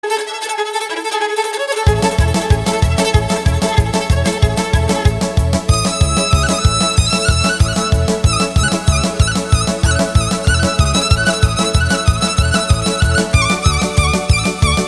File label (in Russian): skripki i svistoplyaska